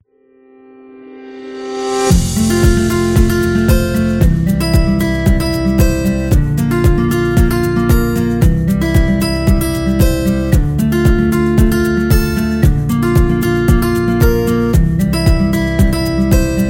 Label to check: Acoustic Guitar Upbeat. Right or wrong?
Right